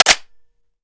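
weapon foley assault rifle reload empty bolt release; weapons; gmod; viper; scharlie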